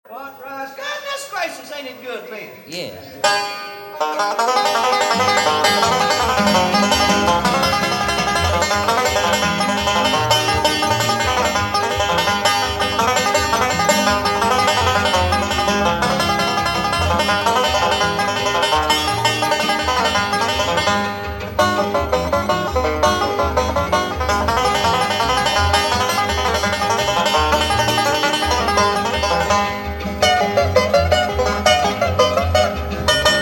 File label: Instrumental